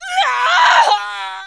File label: death